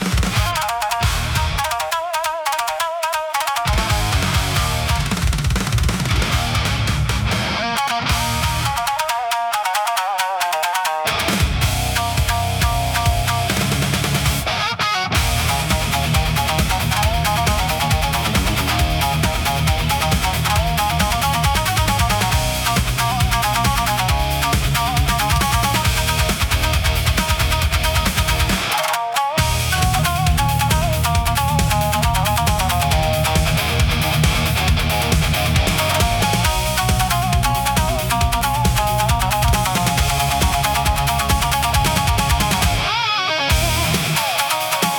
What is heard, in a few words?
Blade of Broken Thunder, intense Japanese rock